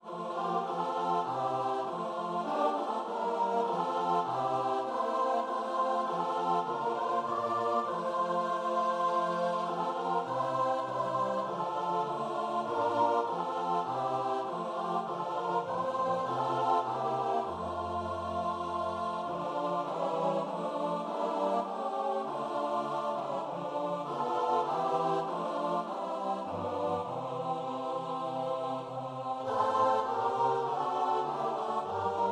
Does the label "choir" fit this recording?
Yes